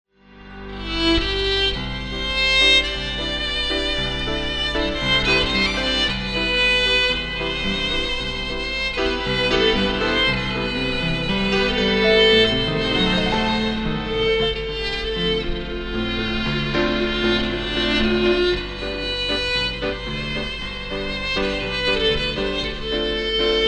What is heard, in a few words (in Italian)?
VIOLINO